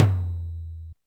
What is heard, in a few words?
Yamaha RM 50 Toms